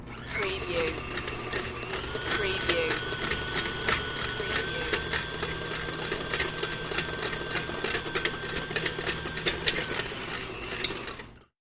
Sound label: Blender